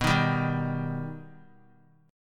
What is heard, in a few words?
B Augmented B, chord; strummed; JGuitar